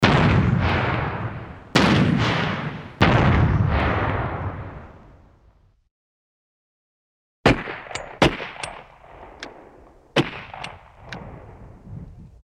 explosions mix

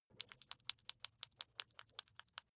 flesh wet fast squish

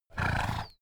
donkey grunt snort

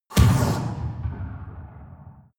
rpg shot distant

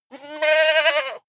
goat baa male

